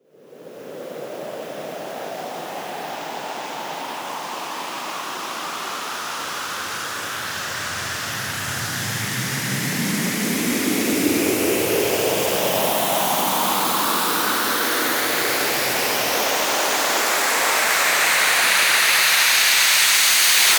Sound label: FX Sweep Up; vengeance essential club sounds-3